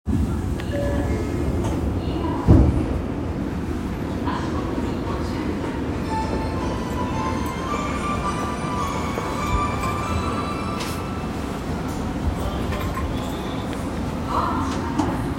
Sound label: train sounds; 東西線飯田橋東